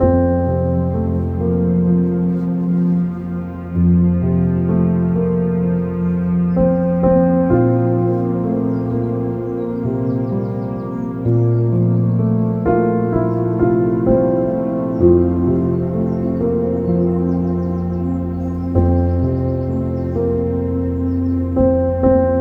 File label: background music